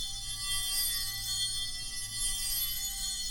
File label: drag loop; smite; khepri